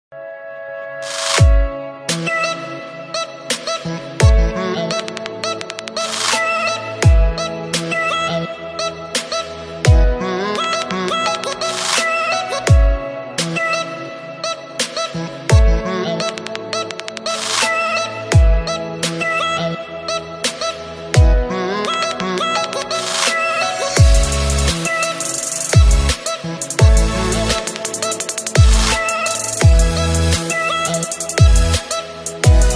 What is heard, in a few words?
New Electro Light Ringtone For Apple iPhone; ringtones